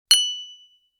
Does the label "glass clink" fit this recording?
Yes